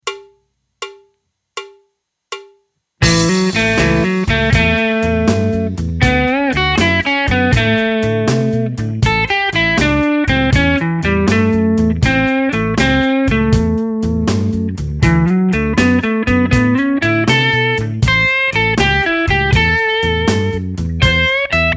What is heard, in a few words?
blues